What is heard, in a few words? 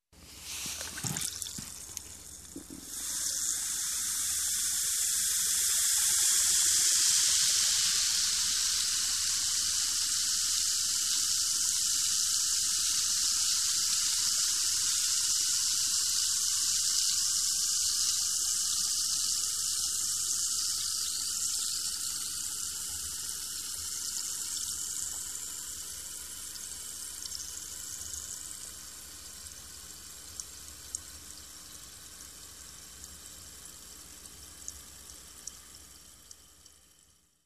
Pouder dissolves in water